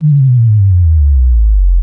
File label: software fatal; paranal; soundscape